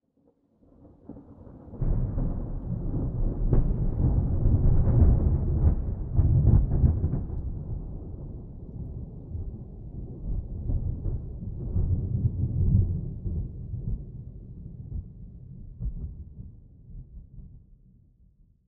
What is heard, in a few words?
medium, nature; Atmospherics